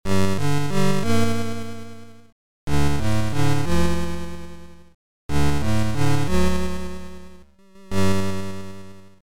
GAMEOVER

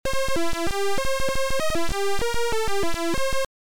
Title, Beeper; Rana Rama

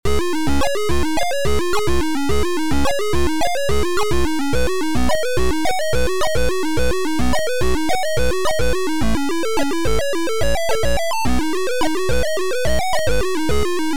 intro menu; OldTower: intro-menu; for Vector-06C

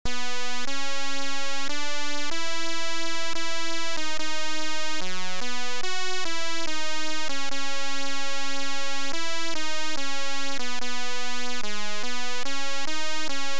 Turn the Tape Over, Beeper; Gemini Wing

